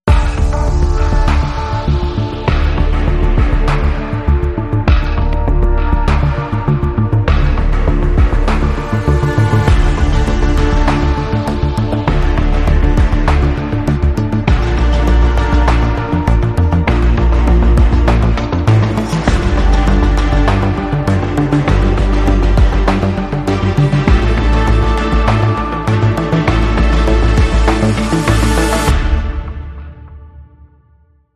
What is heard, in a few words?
Emergency Landing, Underscore